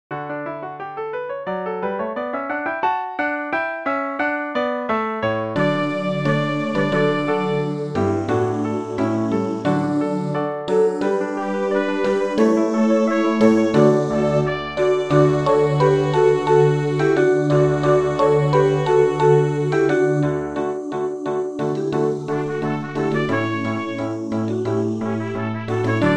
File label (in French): Joie sur la terre piano trompette